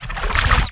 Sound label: p atk watergun cast